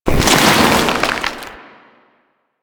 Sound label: Debris Fabric; Warframe; Ambience; Entrati